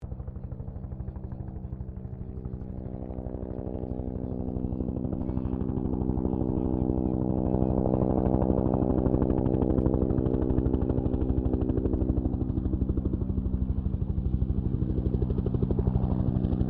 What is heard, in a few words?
HELICOPTER BELL UH1 IROQUIOS PASS LONG; RAN UH-1 Iroguios; Helicopters (Sound Effects:Vehicles)